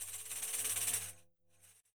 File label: Roland MV8800 percussion; MVPERCUSSION